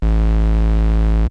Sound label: nasty tone bass, Synth